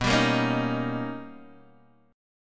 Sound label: B Augmented Major 9th B Guitar DADGAD